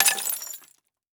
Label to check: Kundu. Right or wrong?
Wrong